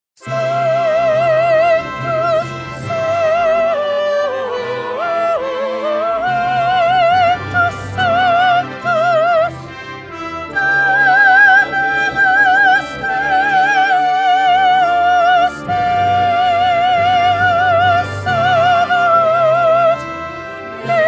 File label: Soprano; Mozart; Coronation Mass